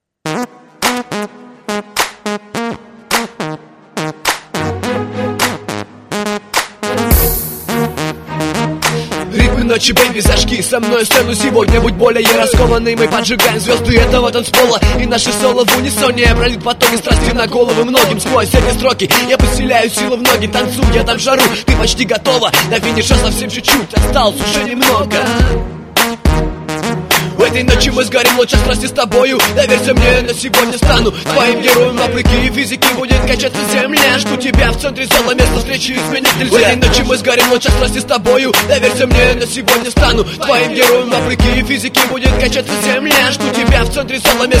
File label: rap live battle